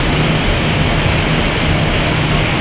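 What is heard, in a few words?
ambience interior loop; Tony Hawk's Project 8; XBOX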